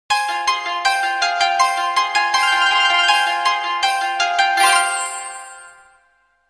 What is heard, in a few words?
music